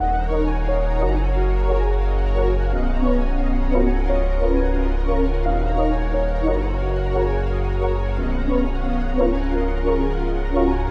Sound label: Wave Pool 176 BPM Dm